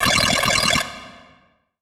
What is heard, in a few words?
Tricky Monster